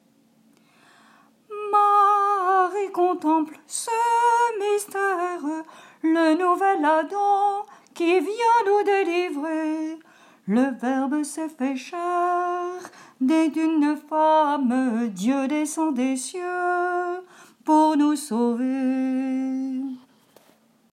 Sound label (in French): chant de Noel